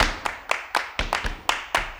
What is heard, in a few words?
120 CLAPS